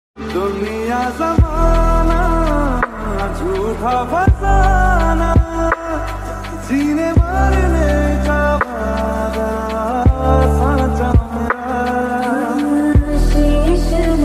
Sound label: Sad Hindi Song Ringtone